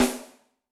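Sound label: Ambient rim, Yamaha DTXpress-II snare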